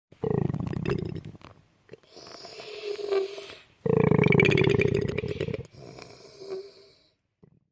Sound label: koala